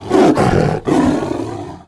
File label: roar